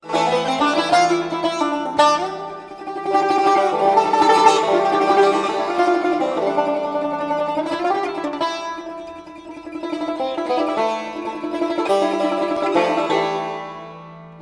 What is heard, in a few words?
Sadness